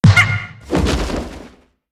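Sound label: meerkat death; monster; Senillinea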